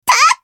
攻撃; ルディン

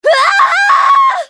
死亡; セルバ

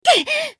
被ダメージ; ダリア

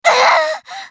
ダウン; ソソ

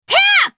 攻撃; ダリア

